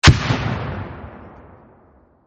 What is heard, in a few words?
M67 Explode, M67 Anti-tank Explosion; War; VRSonic